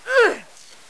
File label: attack, spider; svencoop; paranoia